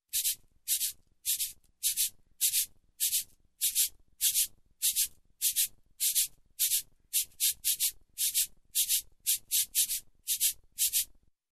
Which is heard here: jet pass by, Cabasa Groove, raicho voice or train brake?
Cabasa Groove